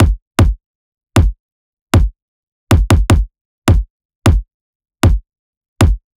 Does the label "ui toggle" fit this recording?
No